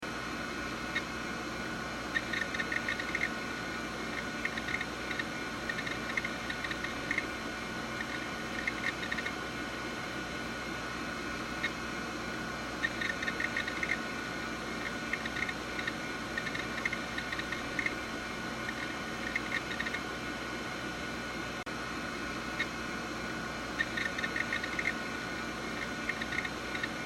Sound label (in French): Ambiance armoire de disque dur; Blind Survivor